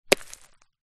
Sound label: impact rock small hit solid ground